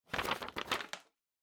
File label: Flyer Flip Over